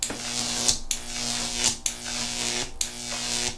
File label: Shock; mekanism